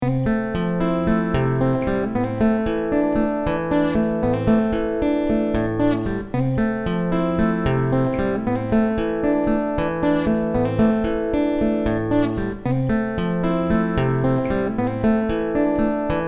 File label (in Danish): Simpelt guitarspil guitar